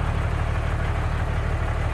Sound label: door move; Golden Crayon Sounds